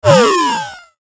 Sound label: Descend High Frequency Digital Fuzz; Descending Sound Effects; Blastwave